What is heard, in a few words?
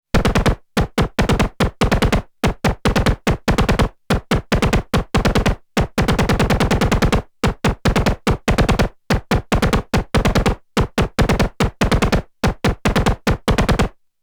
Snare; Treadstone